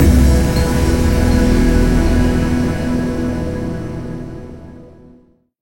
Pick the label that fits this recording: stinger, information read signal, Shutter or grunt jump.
stinger